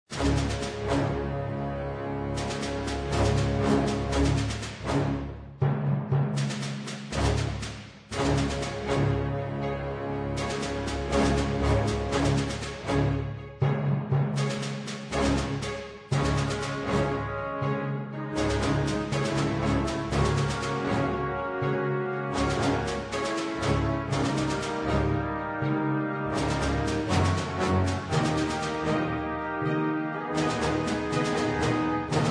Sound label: Combat Music; Boom Beach